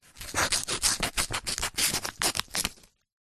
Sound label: foley paper cut slice open knife letter